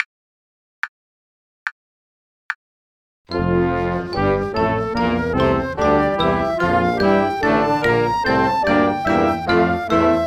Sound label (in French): gamme de fa polyphonique (ensemble) noire à 72